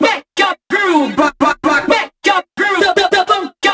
House Loops, Vocal Loops House 128BPM

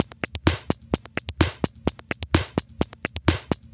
Minimal Loops

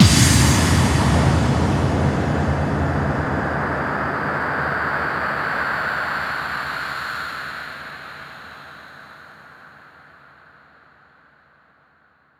Bassdrums FX, FX Kicks; Vengeance Samples

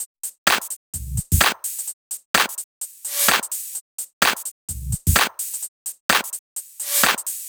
128BPM Kit; Vengeance Samples; VFH2 128BPM Cool Kit

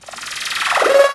Lazers

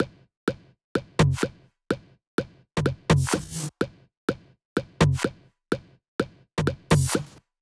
Kit 12, 126BPM; Purple Kit; Vengeance Samples

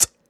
Beatbox HiHat, Human Beatbox; Vengeance Samples; Special Sounds

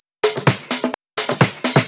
Percussive Loops 128BPM; Vengeance Samples

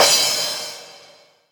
Cymbals Crash; Vengeance essential club sounds-3

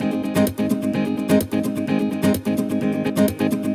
Nylon Guitar G# maj, 128BPM; Vengeance Samples; VEH3 Nylon Guitar Kit 1

